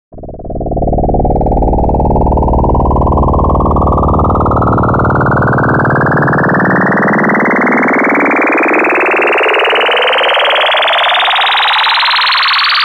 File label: energy charge; 攻撃; kantai